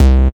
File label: Bass C, Offbeat Bass